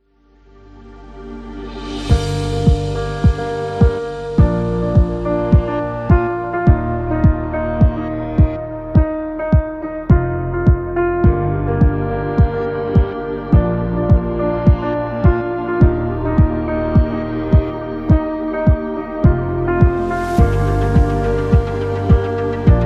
Piano Beat

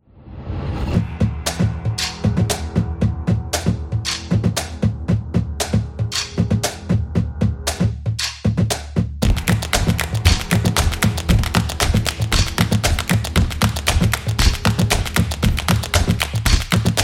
Intro Clap, energetic drumming

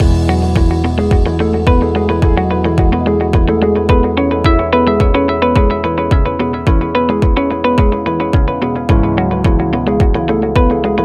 Information Loop

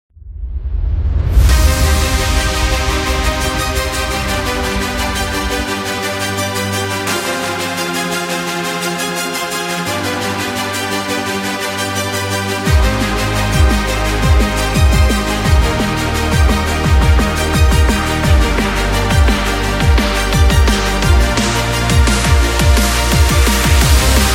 Epic Sport Action, epic sport metal

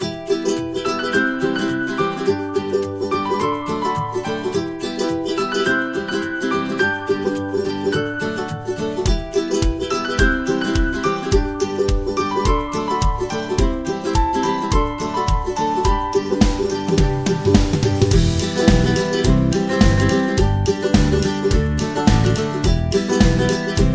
Optimistic Folk, happy music

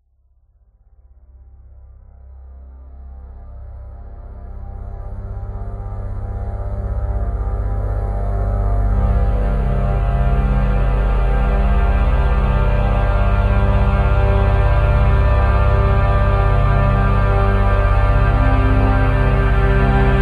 A Dark Ambient

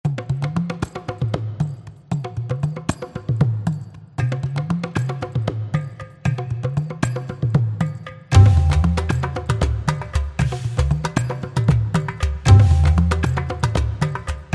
Indian Drum

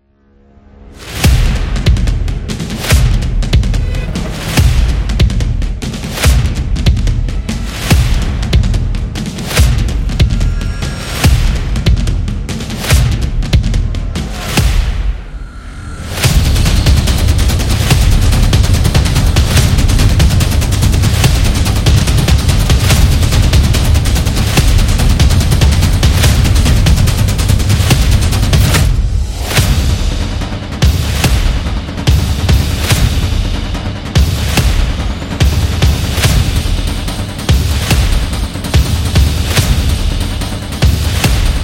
Epic Drums Cinematic; monster attack

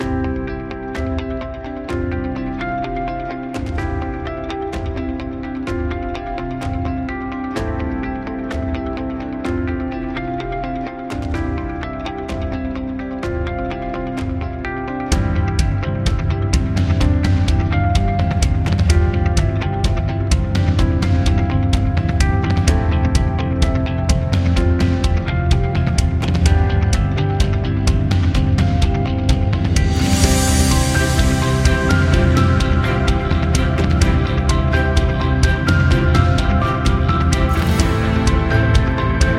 Inspiring Epic Corporate

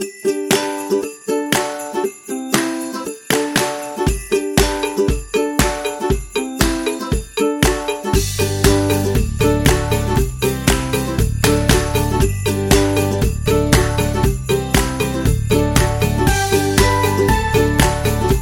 Upbeat Christmas; snow

